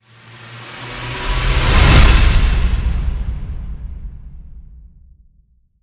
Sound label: Speedway Slam